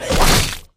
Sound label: attack; zoochosis whale